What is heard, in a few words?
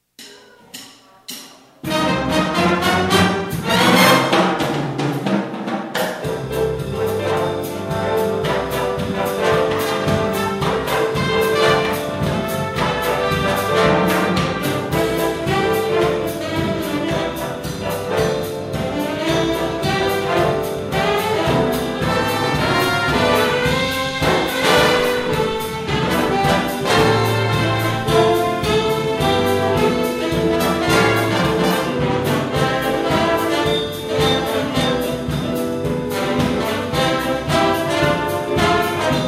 Jazz Ensemble